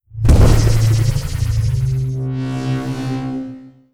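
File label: shield fire layer; apocalypse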